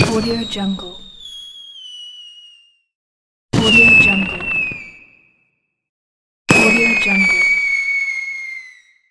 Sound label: fireworks whistle; blacklionproductions